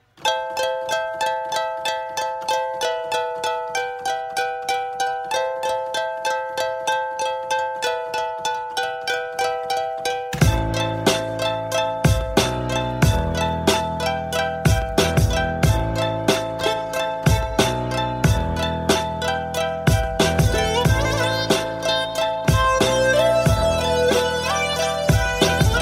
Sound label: english song instrumental tone ringtone